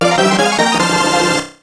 guau; RPG Maker; Legend of Heroen v1.20

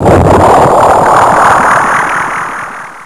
Escupe; RPG Maker; Legend of Heroen v1.20

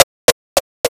pasos; RPG Maker; Legend of Heroen v1.20

